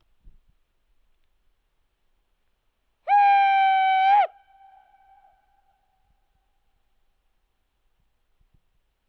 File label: Wolf two